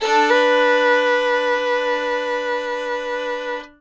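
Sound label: fiddle hammer G4; Sampleheads; Whole Lotta Country 1 & 2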